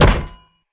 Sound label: Duct Break; Door